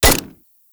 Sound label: Fire Mech; Warframe; Latron Prime